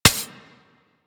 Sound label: Foot Stab; Enemies; Abilities; Warframe; Corpus; Arachnoid Hunger